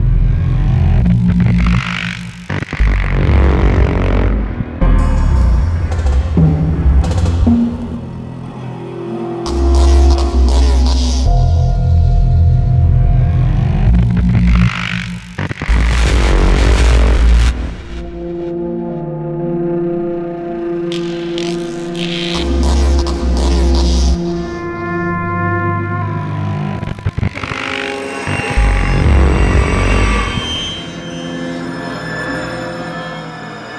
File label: the room bass; svencoop; 8bit